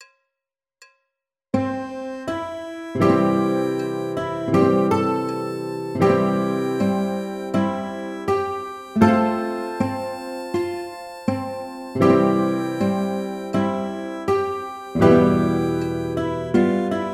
Michael Row Your Boat Ashore Harmonica Ear Training